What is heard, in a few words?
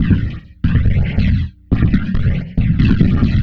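Saver Loop, beats; Sonic Foundry (Sony Creative Software); Esoterik Beatz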